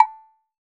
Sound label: Agogo Hi; Roland BK-5; Percussion